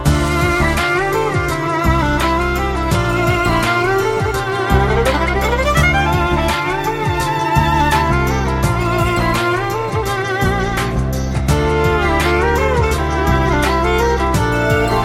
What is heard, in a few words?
Heart Touching Sad Ringtone